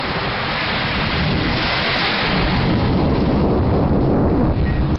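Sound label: storm hurricane wind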